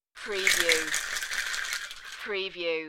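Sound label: Ice Shaker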